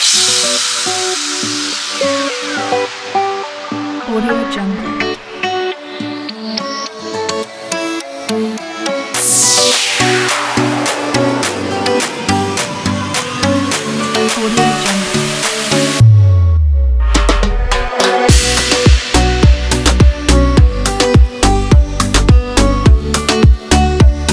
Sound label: latin reggaeton